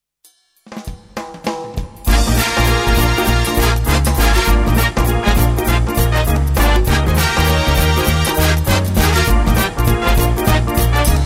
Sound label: groove bigband